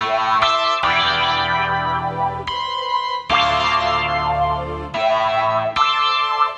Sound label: Guitar Guitar